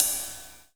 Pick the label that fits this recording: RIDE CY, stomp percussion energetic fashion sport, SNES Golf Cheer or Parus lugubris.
RIDE CY